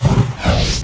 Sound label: healer attack; jorgan